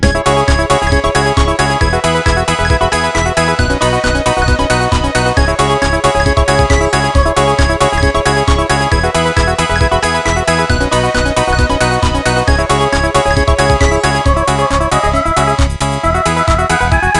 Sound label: butterfly ringtone